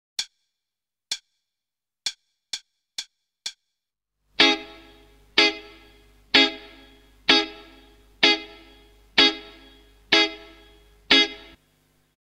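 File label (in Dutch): Ritme, gitaarstarter; Meespeel CD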